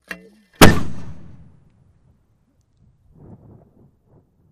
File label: German 8.8 cm Infantry Mortar - Has Tube Drop And Concussion Exit